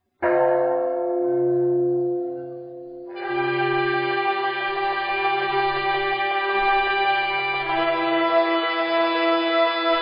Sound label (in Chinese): 樂奏咸和之曲 無鼓聲; 進饌; 樂奏咸和之曲一